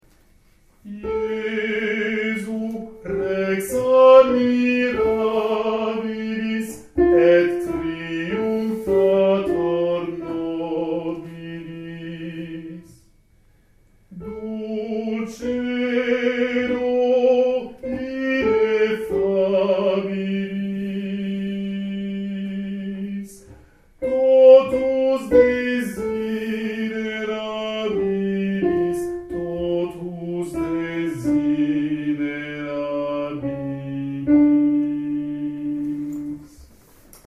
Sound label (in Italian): Soprani; JESU REX